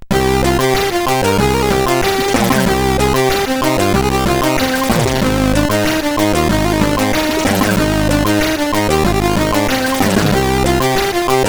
Driver Test, CSG8580; Driver 13 Test Hubbard

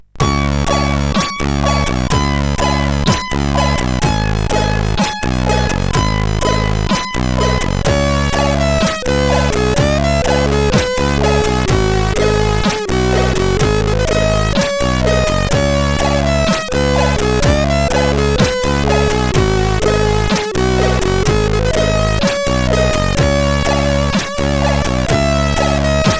Reggae sid CSG8580R5

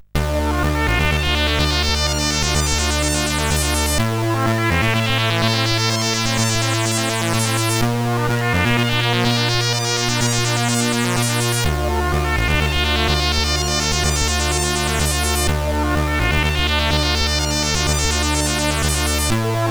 Flute, CSG8580R5

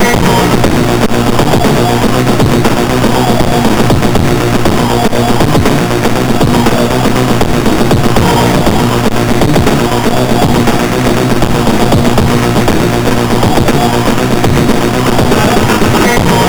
Funky, sid MOS6581R2; Funky Shit; Astovel

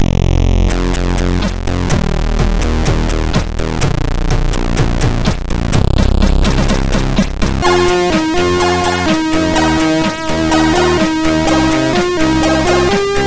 Acidtime, MOS6581R4

